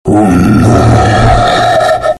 Dinosaur Burp

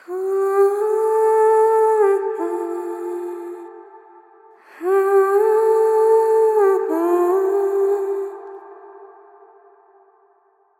Vocal Swell

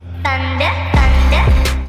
Thunder

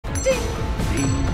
Ding

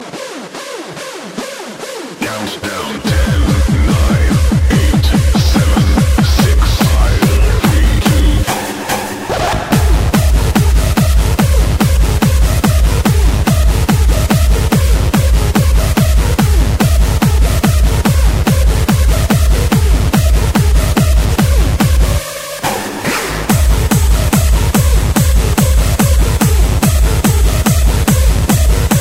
Hardstyle

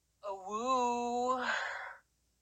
Awoooo

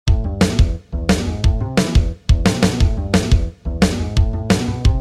Drums Tones

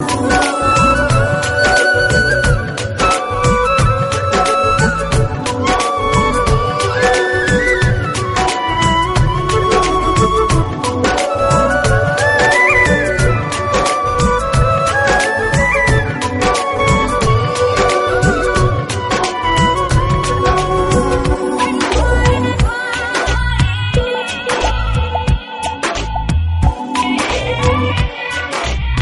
Romantic

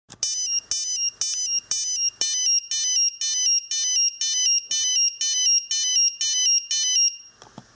Boss 910 brand alarm

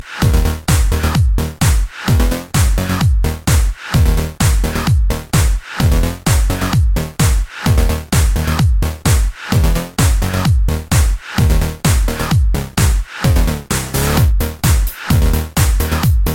Tecktonik Mix

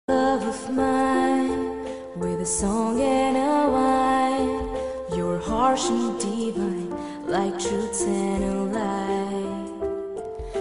Femle

